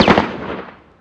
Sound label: gun ak47 med